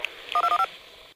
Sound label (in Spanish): Tono De Radio